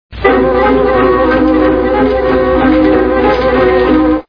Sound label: witch music; wizard of oz